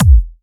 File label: Trance Kicks, Bassdrums